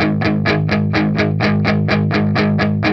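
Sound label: 125, Vintage Blues Guitar rhythm; USB Soundscan vol.22; AKAI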